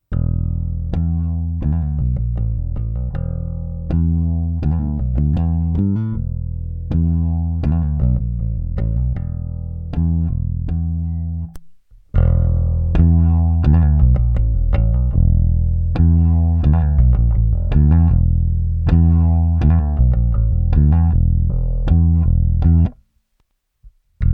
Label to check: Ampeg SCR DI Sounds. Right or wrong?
Right